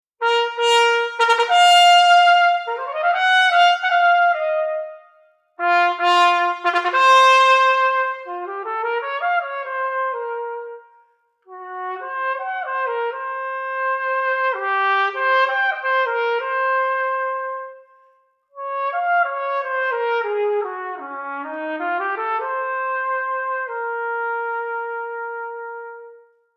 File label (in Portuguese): trompete; Conhecendo Instrumentos Musicais